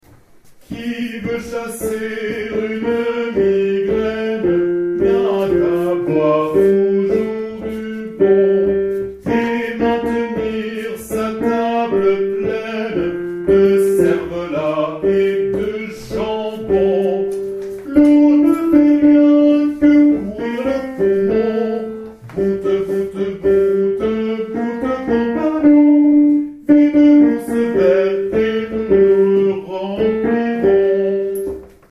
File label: Tenors; CHANSON A BOIRE